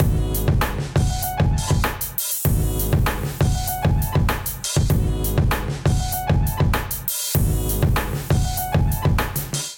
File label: Beat 85 BPM, Drums; MIDI Clips; Canvas; Ableton; Chop and Swing